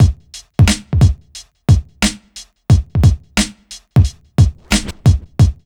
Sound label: BEAT, Hip Hop; HHFX 89